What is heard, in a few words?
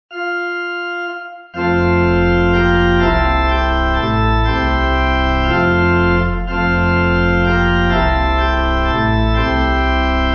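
Pipe, Pipe Organ; Communion Service (1940/1982 Hymnal-USA)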